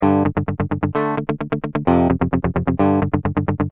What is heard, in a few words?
Guitar no amp